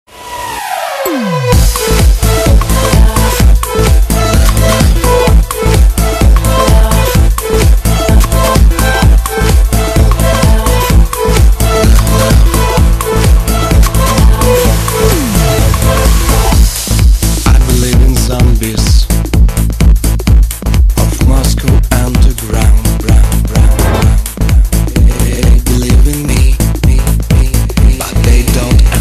Smashing Beat

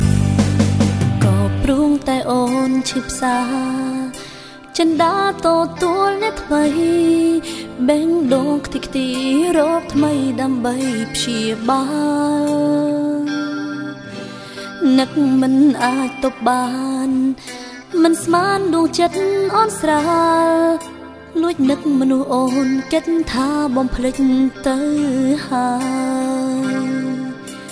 Khmer Ringtones

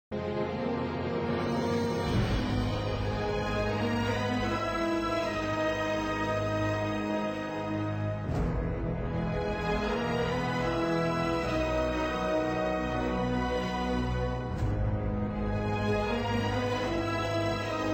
Instrumental; Ra-one

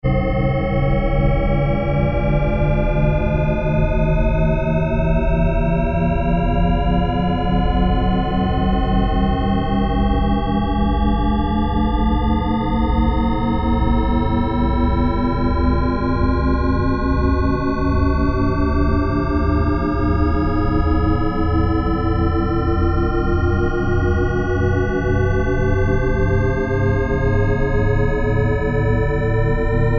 Shepard Tone

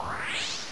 Teleport Out; MMX

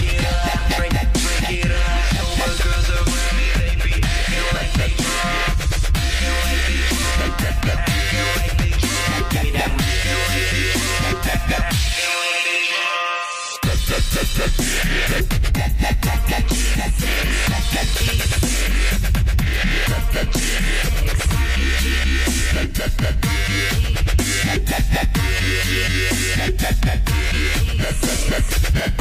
Dubstep

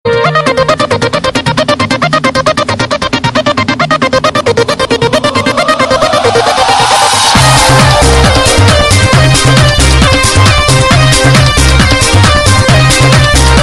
Nagin

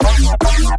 Dubstep One Shot

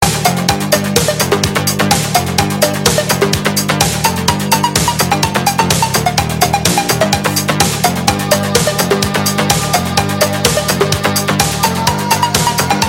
Electronica Ringtone

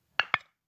Jar lid noise

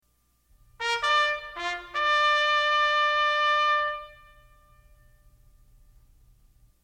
Attention Bugle Call

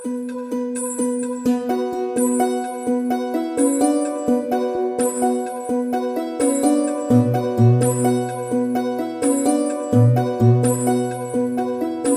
Soft Santoor

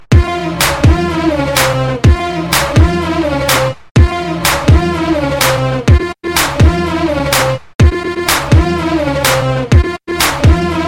Arab Mix

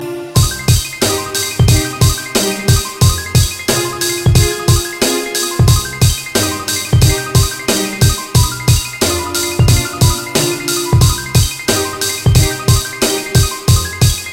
Best Classical Beat

